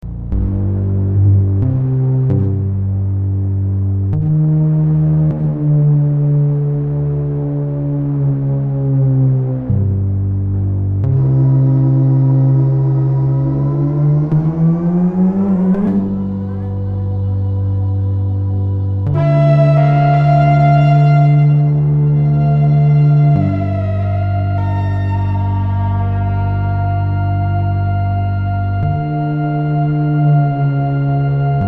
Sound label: string thing drone